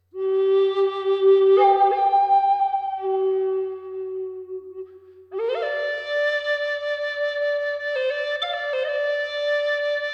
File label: Solfeggio 396 Hz